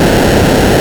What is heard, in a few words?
Arcade Riffs